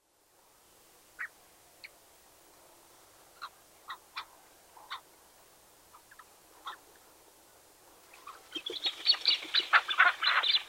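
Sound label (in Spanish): cauquen real, Chloephaga poliocephala, Ashy-headed Goose; cantos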